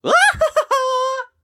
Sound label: into ceiling; Hollow Knight; Collector